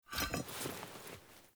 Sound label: Blacksmith Hammer Lift Foley; Characters; Blacksmithing; Friendly; Tuvalkane